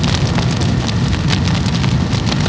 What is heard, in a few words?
Fire Fire; Ambient; MX vs ATV Unleashed